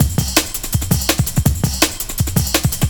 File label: Resolution Break; Jungle; Breaks; Blu Mar Ten - Jungle Jungle - 1989 to 1999 Samplepack